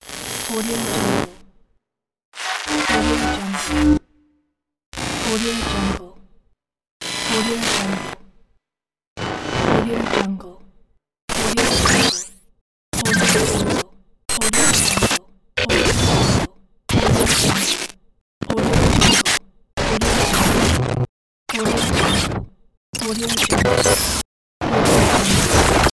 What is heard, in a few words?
glitch